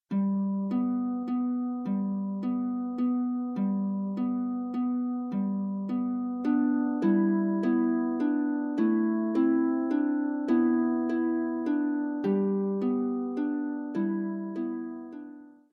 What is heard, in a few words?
Harp; Tudfuls Harp; Pedoli Pedoli; Shoeing Shoeing